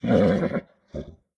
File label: mvt pet vocal; smite; lancelot